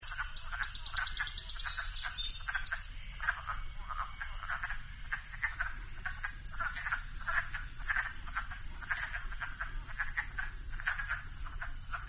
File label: wd frg